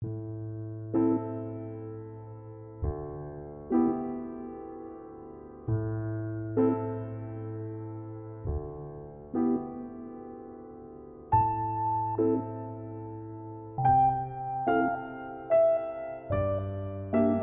Sync Sine Soni

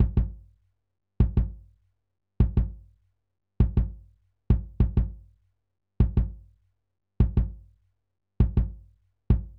Wave Drum KD

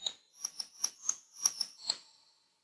Glockenspiel

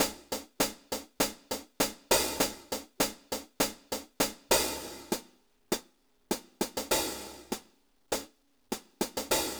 Wave Drum SEP HH; Oracle Mag Mix Phase GL

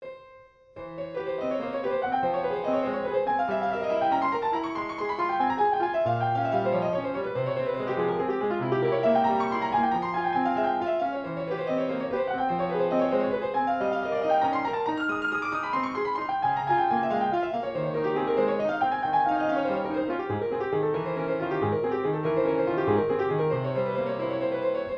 Sync Wavetable Soni

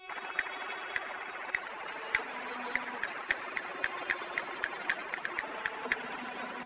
Castanets Violin Applause

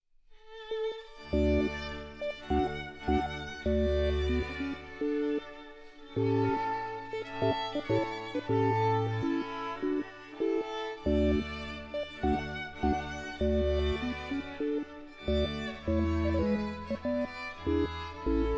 Sync Sine Soni

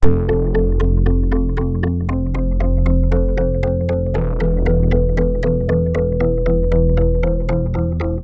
Synth Poly; TSMtoolbox

